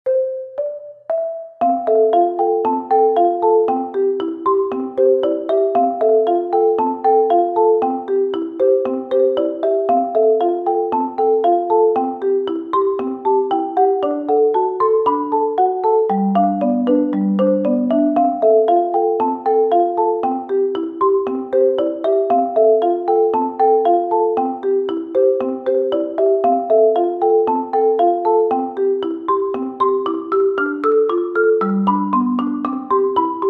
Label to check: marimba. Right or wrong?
Right